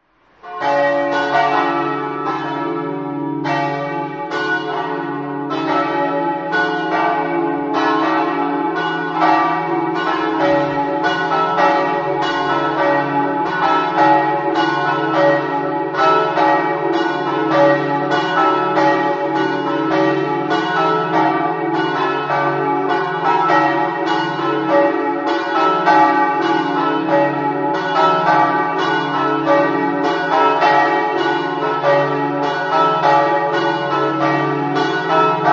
smartino, campane

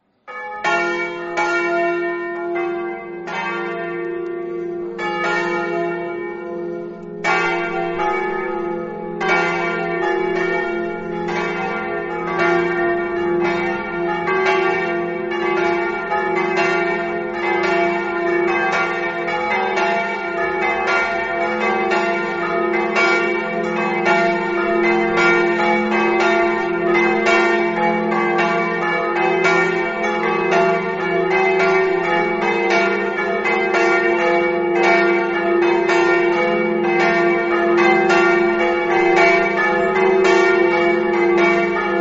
carlino, campane

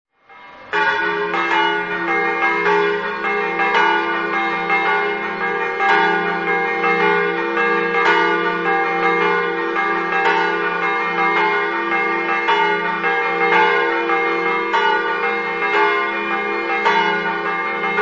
joannis, campane

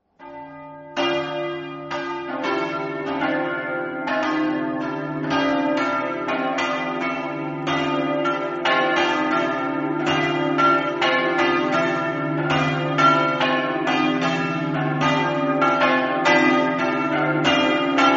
montenars, campane

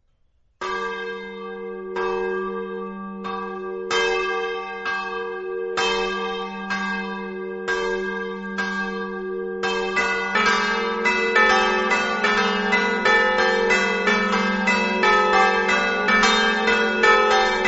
medea, campane

